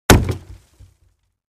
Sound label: Crate Palette Type 2 Hit with Sledge Hammer